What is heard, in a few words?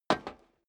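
step boots single metal dry single stair down, footsteps; schritte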